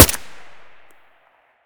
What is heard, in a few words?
ak74u silencer stereo; STALKER; IWP